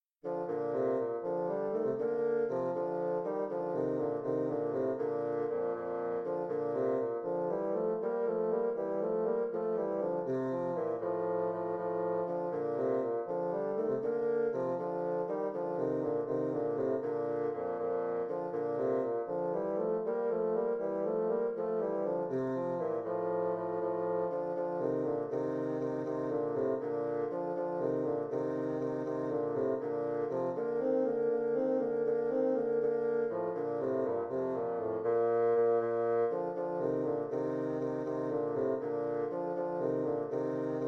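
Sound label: gigue bassoon duet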